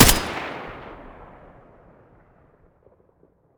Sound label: M4A1 muzzle mono